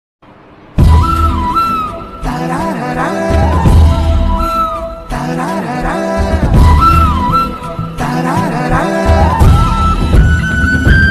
tamil love bgm ringtone tamil love ringtone

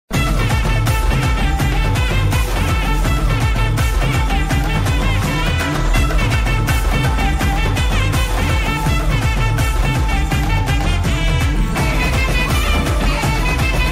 song bgm ringtone 8d version; thiruchitrambalam

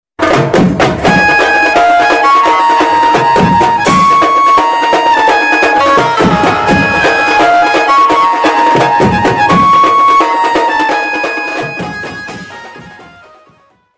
ringtone flute banjo music; jay bhim; जय भम

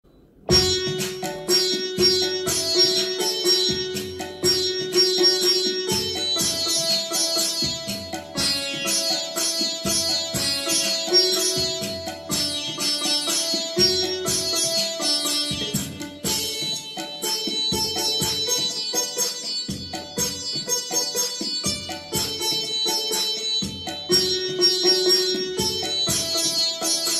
devotional ringtone; Sai Baba

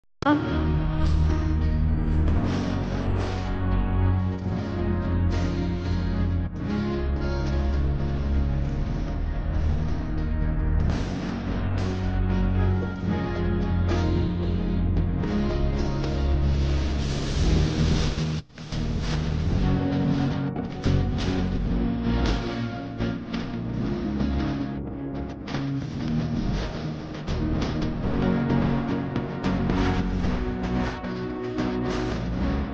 ringtone amma songs telugu; mittapalli studio

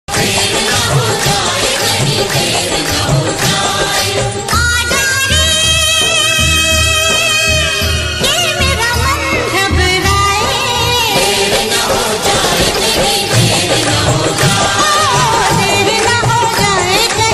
call ringtone hindi music

